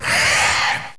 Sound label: spawn runner